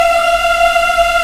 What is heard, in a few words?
VOX African; Roland LCDP11 Africa VOL-1